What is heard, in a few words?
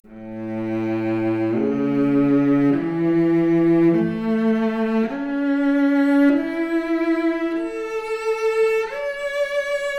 Violoncello arp, Stereo output noised; 4bit